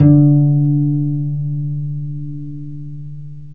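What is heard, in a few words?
strings violoncello pizzicato, Chamber; Roland L-CD702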